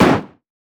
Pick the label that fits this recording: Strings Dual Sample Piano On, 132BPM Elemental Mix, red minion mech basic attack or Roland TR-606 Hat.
red minion mech basic attack